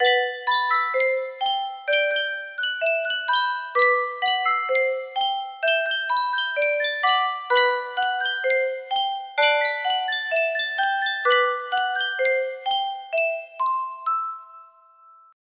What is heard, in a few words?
yunsheng music box, melody